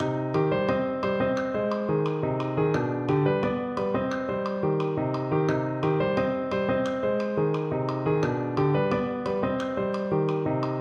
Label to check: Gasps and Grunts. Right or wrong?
Wrong